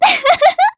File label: Laugh; Zelda laughing; zelda sounds; Skyward Sword